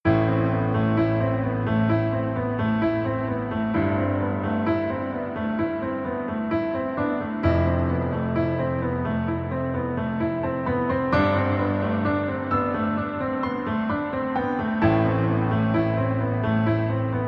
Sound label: butterfly piano